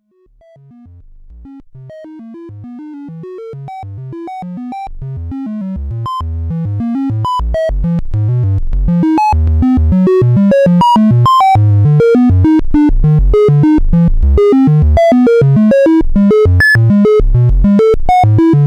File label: Tri Wave; analogsynth